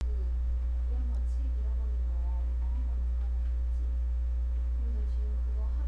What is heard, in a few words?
warning